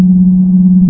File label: strider buster idle loop; video games; ambient; source engine; portal2; outland